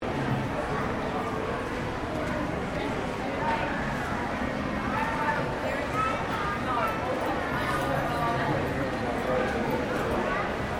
Ambience Queensland Shopping Center, General Shopping Mall Ambience; Stores And Mall Sound Effects; Foundation1